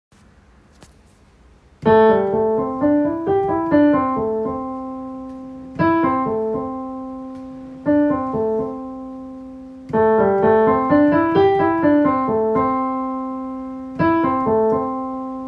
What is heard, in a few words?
Electric Guitar